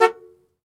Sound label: Jeep Chrke Horn Honk; jeep cherokee 84